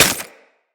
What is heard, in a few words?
Melee Impact Glass